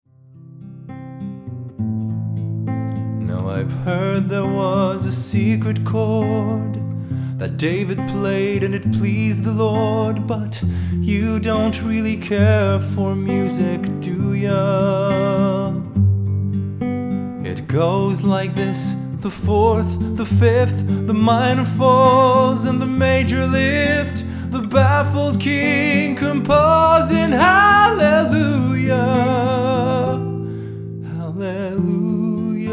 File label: Halleluja